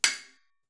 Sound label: ore punch; PixelDefense